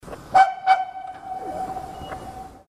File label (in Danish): floejte; E991 Fløjte (damptog)